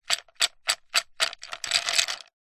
ice cubes several thick drinking glass no liquid shake; food drink; zapsplat